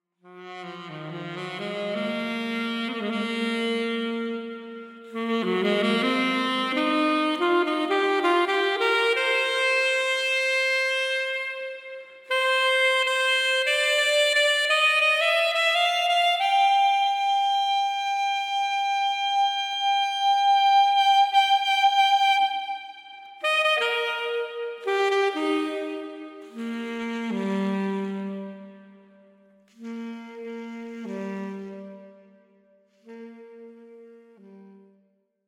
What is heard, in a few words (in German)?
Alt Saxophon